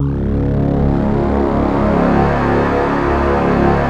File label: C#, Synclav FM; Big Fish Audio; SPACE1